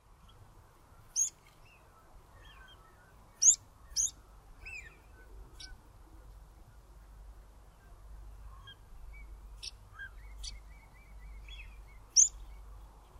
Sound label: rock pipit